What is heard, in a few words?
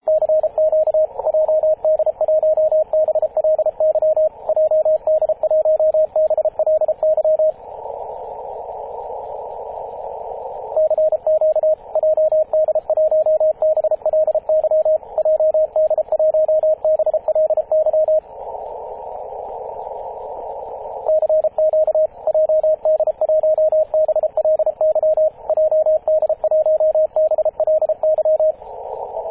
14.027 MHz CW